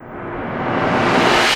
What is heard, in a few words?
Short Fills & Reverse; Vengeance Samples